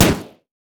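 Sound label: turret basic attack; LoL; heimerdinger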